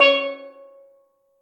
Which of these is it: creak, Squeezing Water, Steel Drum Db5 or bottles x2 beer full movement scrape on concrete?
Steel Drum Db5